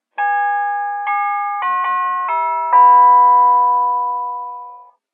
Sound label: hail hail, door chimes